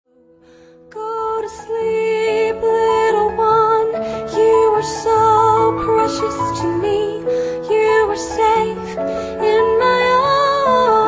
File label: lullaby